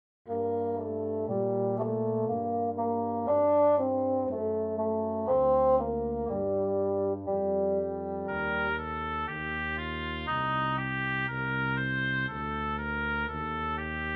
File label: Childrens music